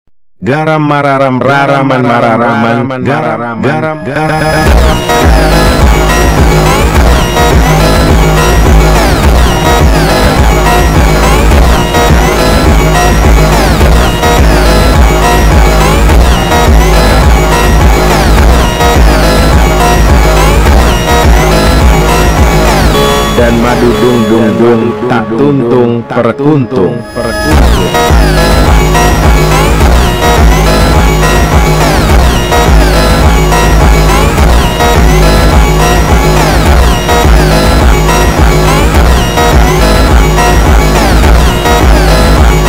FUNK Slowed reverb

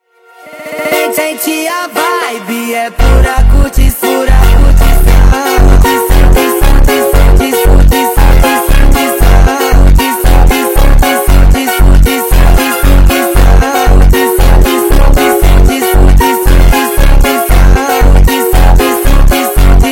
MONTAGEM Slowed

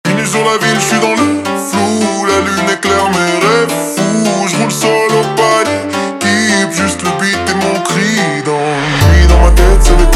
Montagem super Slowed

